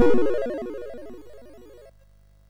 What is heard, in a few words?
sid MOS6581R2; Satan